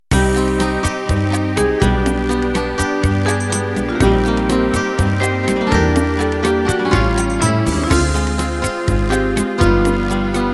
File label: Latin Unplugged End